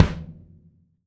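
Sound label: AMB KIK, ambient kick, studio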